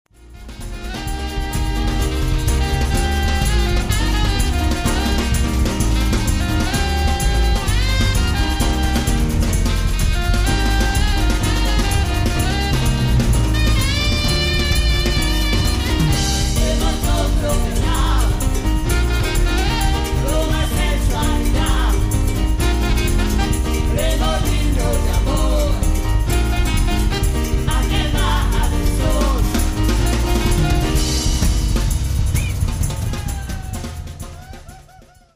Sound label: Samba